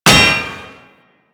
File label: Proc Impact; Mods